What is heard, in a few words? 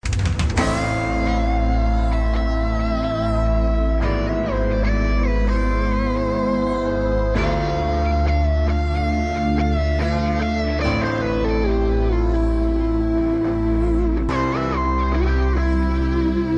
Guitar Solo; Swisgar